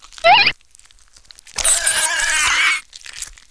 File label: hornetgun shake